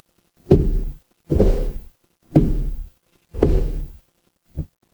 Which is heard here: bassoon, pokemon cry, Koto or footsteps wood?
footsteps wood